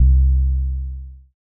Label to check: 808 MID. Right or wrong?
Right